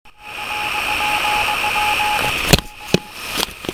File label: RX Home made